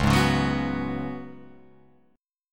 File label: C sharp 7th Suspended 4th C sharp, Guitar Open F; C#7sus4; strummed; JGuitar